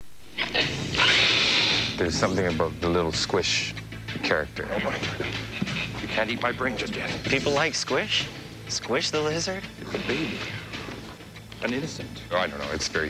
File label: squish